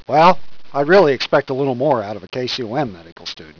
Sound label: Grumble